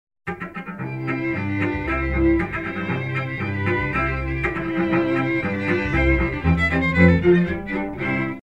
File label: Strings, Olofonia